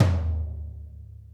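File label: floor; Sonic Reality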